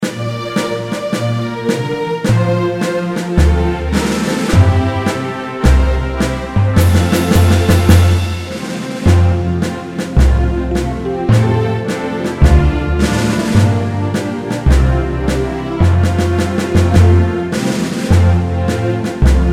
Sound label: Anthem Beat; convent secondary schools in nnewi; Carol Standard Convent Ichi